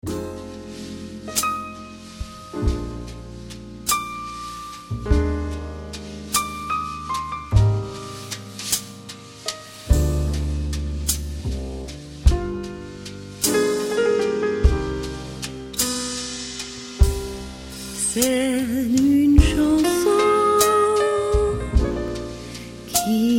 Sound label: mit gesang